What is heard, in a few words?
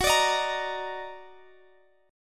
Eb Minor Major 7th Gb, chord